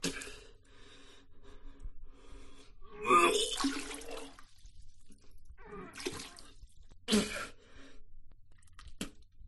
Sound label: puke vomit